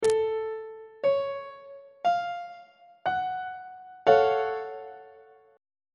g flat minor major 7th 1st inversion on treble clef